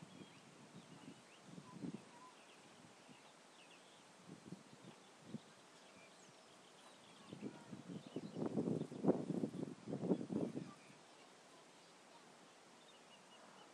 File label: country sounds